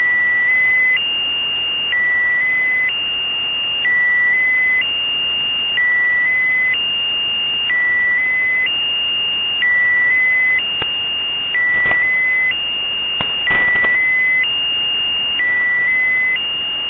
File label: Wheel